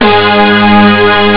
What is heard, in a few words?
roll; Amiga Soundtracker Sample Packs